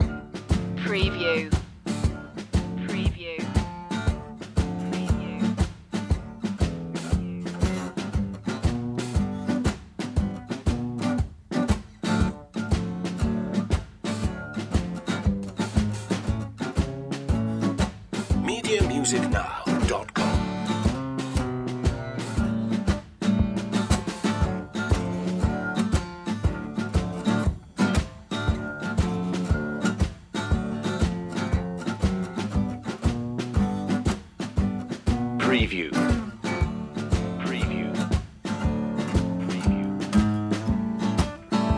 barbed wire blues loop

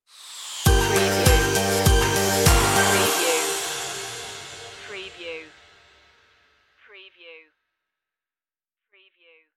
big softee stinger

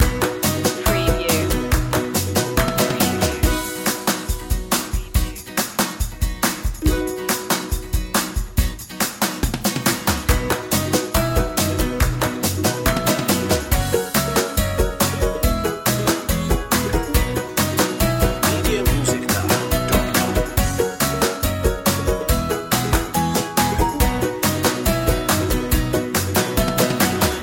keep it simple loop

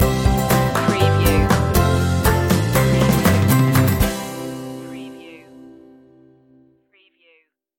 born to be happy stinger

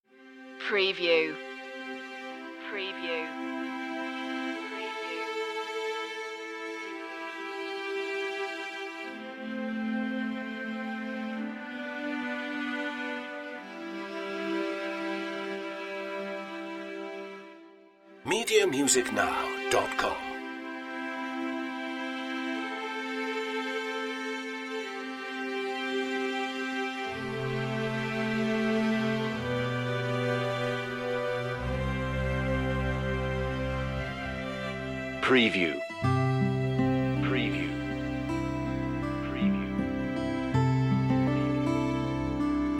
no clarinet

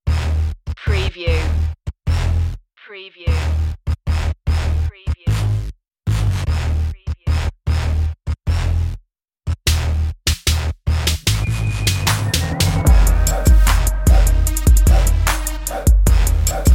bad ass hip hop

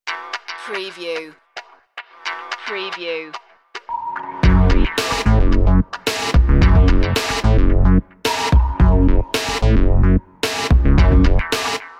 80s cop funk

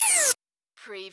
Servo Panel Sound - Servo

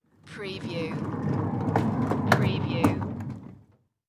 Cart Roll By

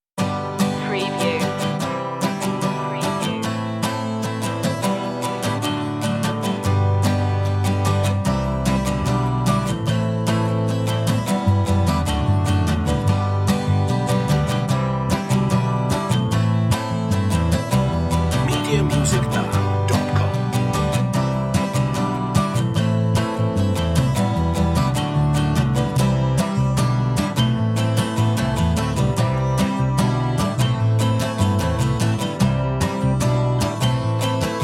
underscore no drums

